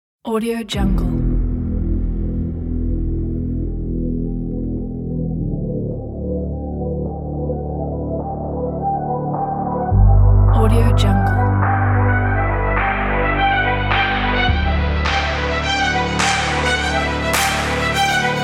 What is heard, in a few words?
crossfit epic trailer music